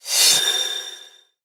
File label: results sound